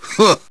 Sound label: haah; vega